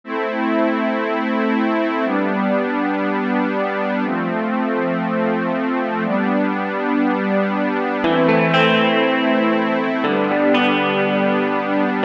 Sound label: Main Menu Guitar Version; Neon